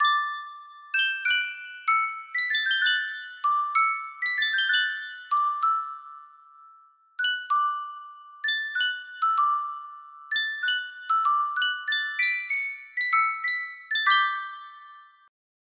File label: Sankyo 12 note music box, music box melody